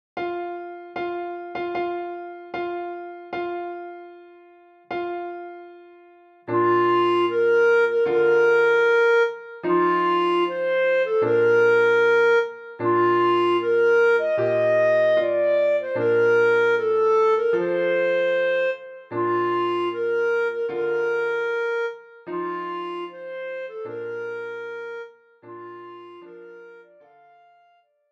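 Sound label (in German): Hochzeitsmarsch Bassklarinette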